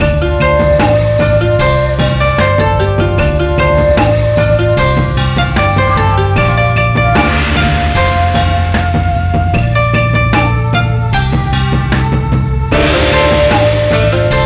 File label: loop